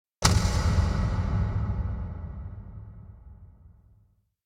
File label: Door Slam Medium - Reverberant; Hollywood Edge; sound designer's toolkit v2